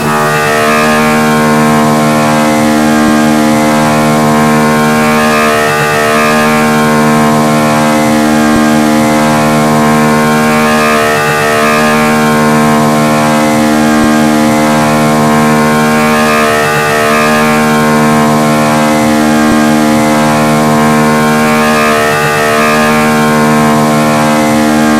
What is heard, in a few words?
V6T on mid; AOS F1 2022